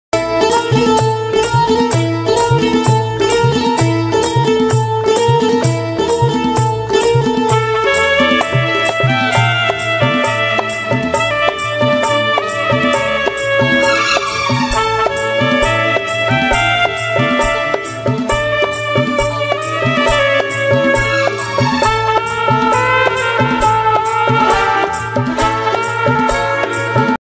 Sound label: ringtone saxophone music ringtone instrumental music ringtone